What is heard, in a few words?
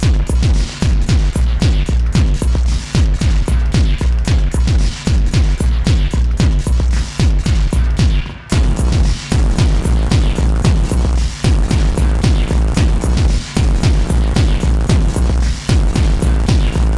drums processed complete